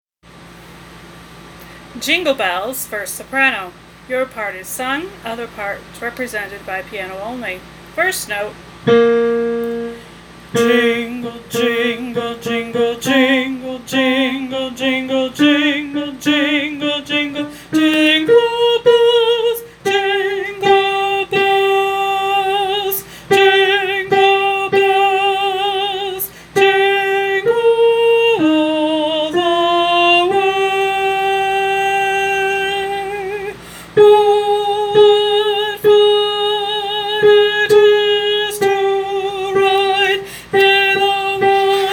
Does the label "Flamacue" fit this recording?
No